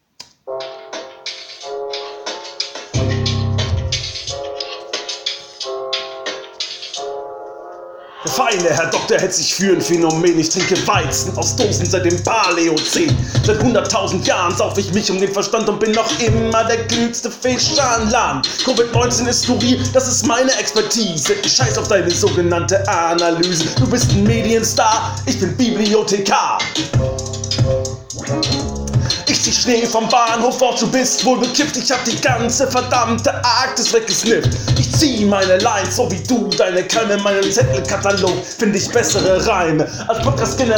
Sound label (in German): mit beat